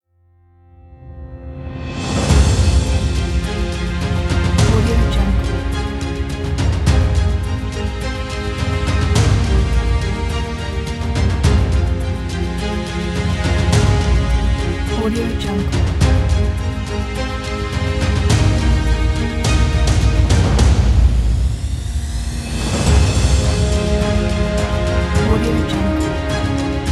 epic

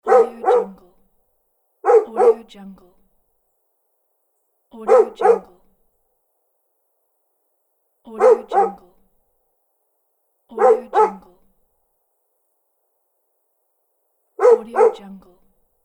dog barking loop

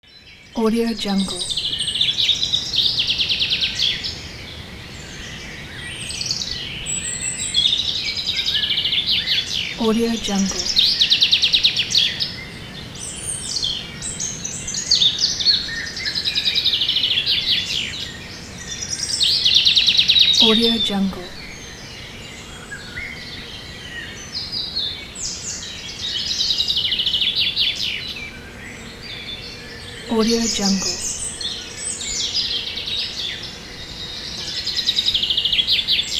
morning birds jungle

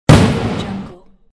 fire cracker

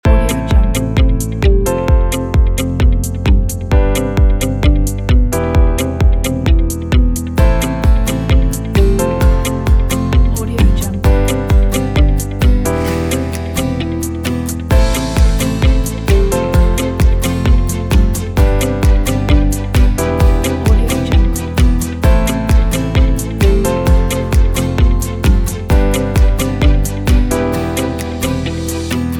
for corporate

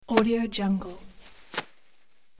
grab and hold toilet paper

